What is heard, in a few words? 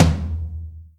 drum hit clap